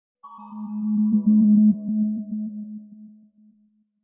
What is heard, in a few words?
Stab Low Frequency Electronic Tone Stab with Reverb